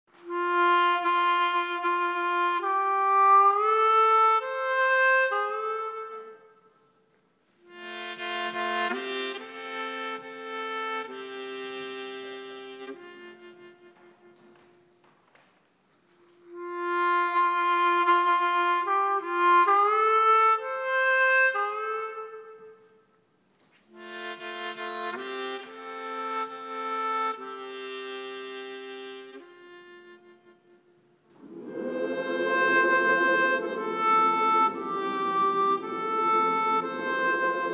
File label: harmonica gospel choir